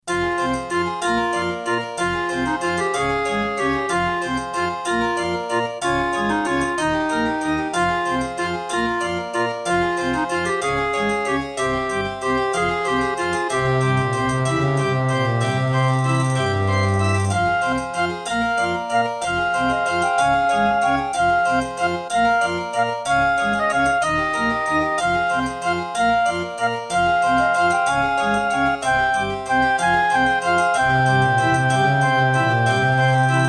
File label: Track 6, Roland SC-55; Death Gate